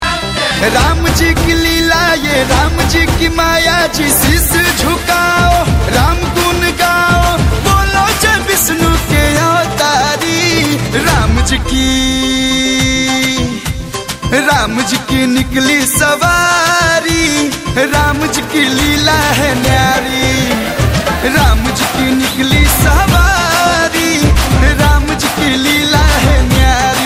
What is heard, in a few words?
Bhakti song